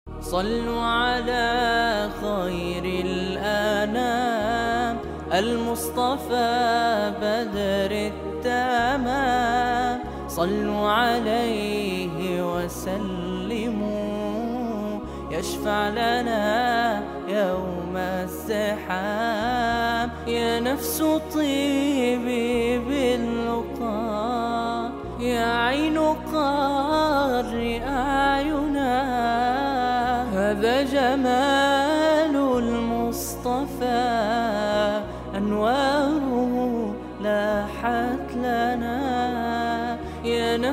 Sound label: Sallo Ala Khair Al Anam, Vocals Only, nasheed